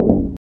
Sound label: glass footsteps, infected walk; player; source engine; left 4 dead 2